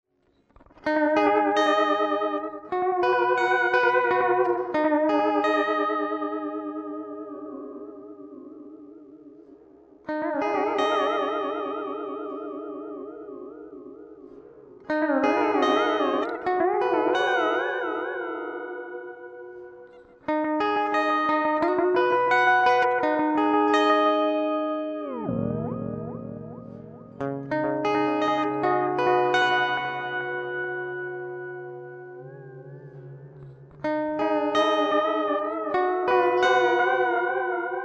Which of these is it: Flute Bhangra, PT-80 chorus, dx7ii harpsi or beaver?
PT-80 chorus